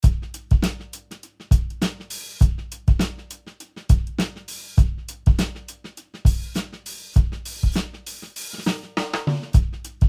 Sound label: punchy lo fi dry, Drums